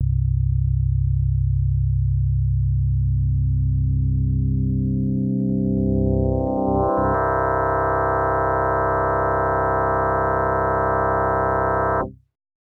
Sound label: amp rising, fm-synthese